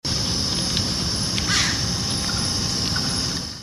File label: horsfields flying squirrel, Mammal calls; Iomys horsfieldii; Central Catchment Nature Reserve, Sing